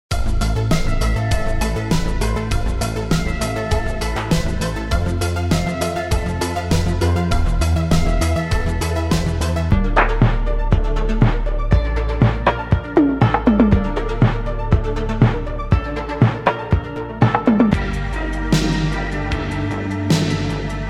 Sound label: darkwave sample pack; prodkult x maestro wons